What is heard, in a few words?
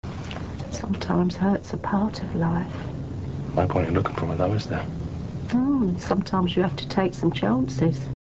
hurt